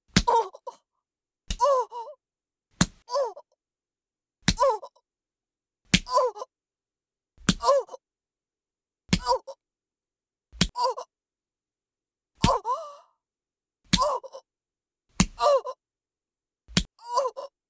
口枷; 効果音あり

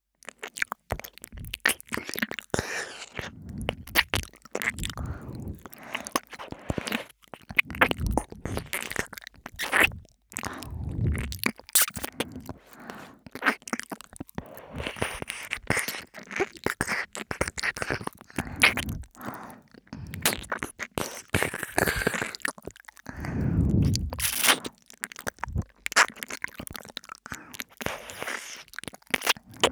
左右耳舐め; 凪＆政

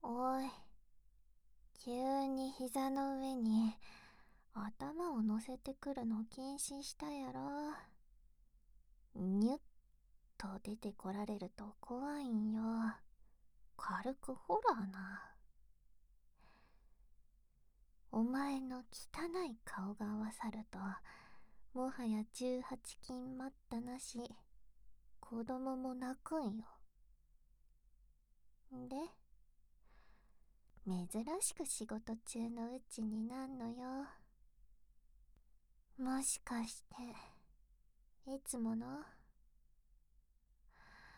甘やかし耳かき, ASMR

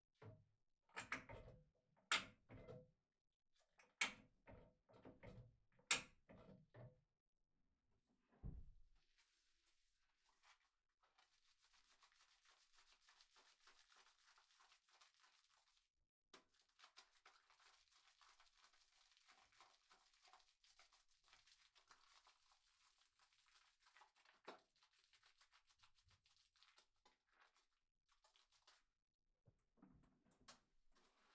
ボディーソープ を 泡立てる; KU100; お風呂に関する音

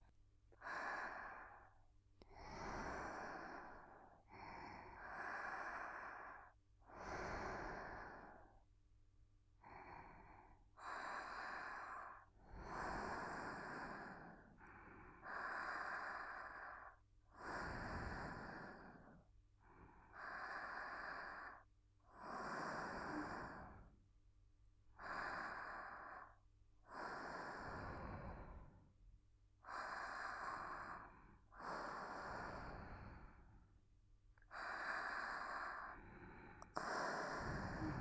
寝息; スキ×キス ご主人様が眠りに落ちるまで耳元で好き好きちゅっちゅしてくれるメイドさん; DL製作班

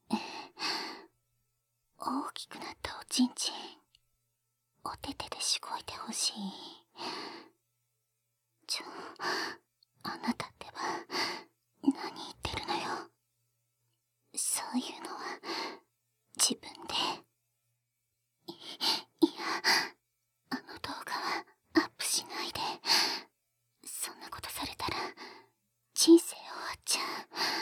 耳舐め手コキ; ユミコの不倫

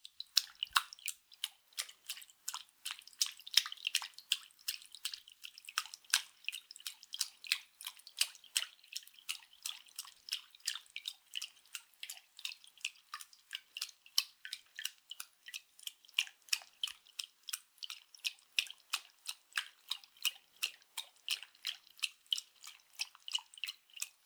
パンパン+空気音 強, 水音効果音

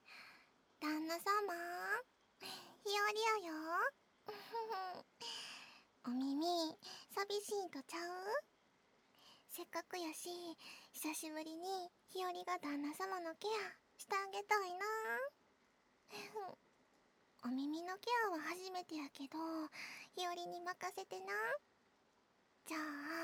ナースひよりの耳かき

